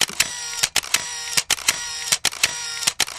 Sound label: 35mm Camera Auto Adv; shutters & movement